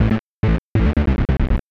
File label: TECHNO BASS 140 A